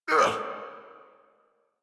pain; Warframe; Tyl Regor Boss; Efforts

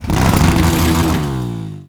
Infested Hammer Accent Rev; Weapons

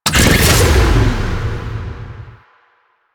Nitro Ability Start, Motorcycle; Motorcycle Ninety Nine

